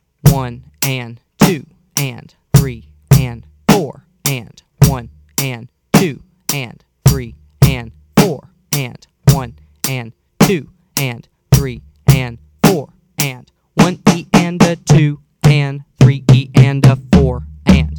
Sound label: Beat 2 With Fill Slow